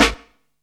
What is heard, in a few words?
under, snares; Zero-G; Funky Elements